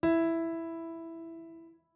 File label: SFX piano e2; through abandoned the underground city 2015 gamerip pc macos